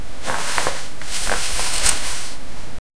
slime moves slowly across

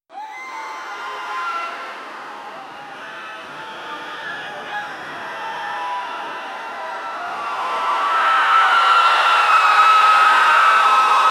crowd screaming sounds

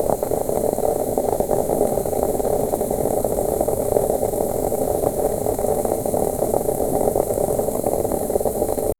witchs cauldron bubbling and exploding

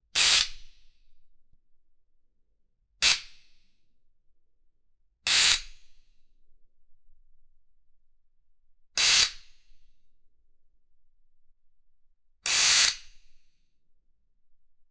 air valve releasing preas

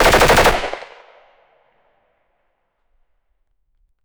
three gun shots

